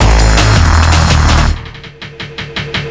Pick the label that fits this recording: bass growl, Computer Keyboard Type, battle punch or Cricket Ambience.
bass growl